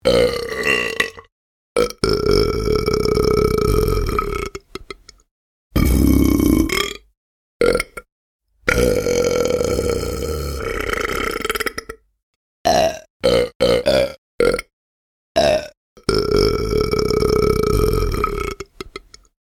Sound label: Burp Tone